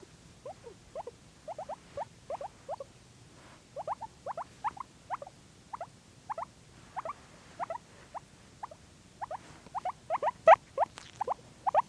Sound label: squeaking